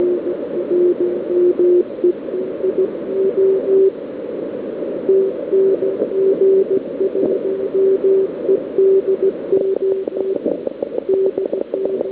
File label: clicks; USA